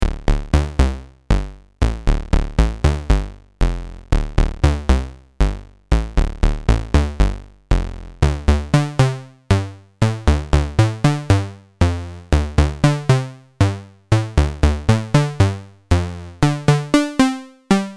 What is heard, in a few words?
WMD VCA Exp